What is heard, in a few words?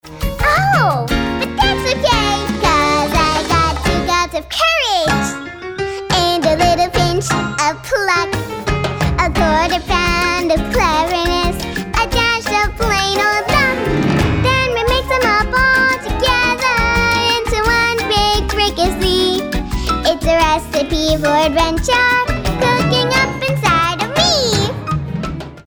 kids singing